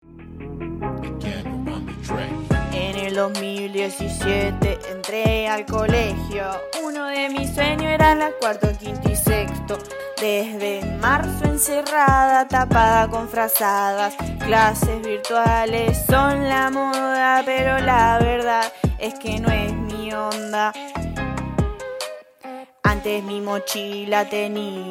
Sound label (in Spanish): hip hop; Muestra virtual de estudiantes de 4° año